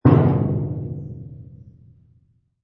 Hit Bodywork Soft, Deep, booming metal impact. Soft object impacting with large piece of car; Deep, booming metal impact. Soft object impacting with large piece of car bodywork. Metal bang, almost Gong like.; Hammer